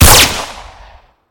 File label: weapon; Autopistol